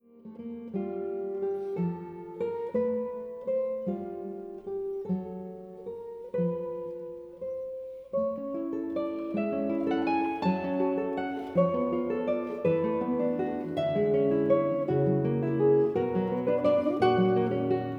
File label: water, guitar